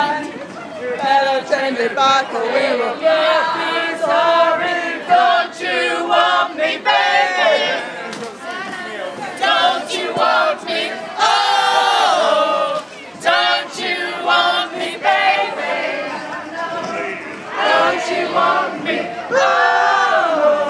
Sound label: bad singing at the silent disco in the spiegeltent